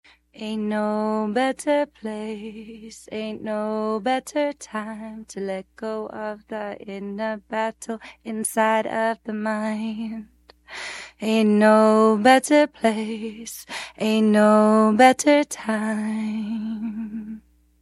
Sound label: Silk Vocal low; waves